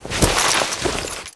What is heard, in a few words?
dying crawl